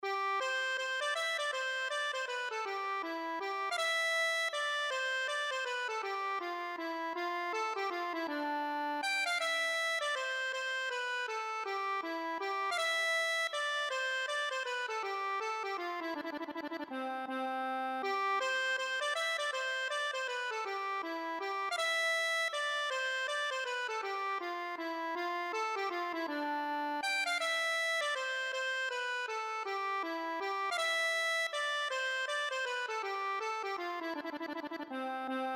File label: accordion